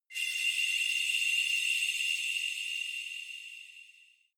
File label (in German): shot universal glance, sweeps und swells; comedy-cartoon; soundpool